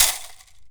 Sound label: PU IL, HAWAII PERC; AKAI S6000 CD-ROM; WorldSounds